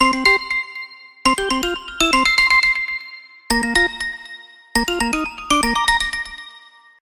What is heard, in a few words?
Sneaky Satellite, ringtones; Huawei Ascend Mate MT1-U06